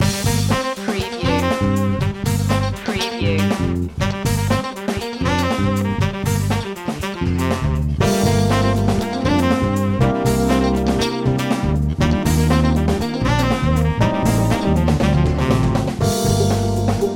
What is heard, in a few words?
funky moves loop